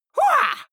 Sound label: goblin fight shout attack; soundpool